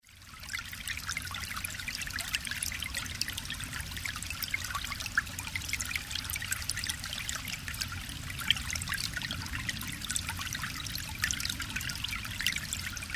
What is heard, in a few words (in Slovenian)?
voda